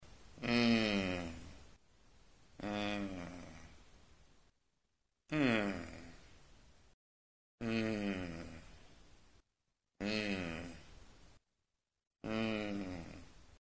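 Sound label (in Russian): kriahtenie, grunting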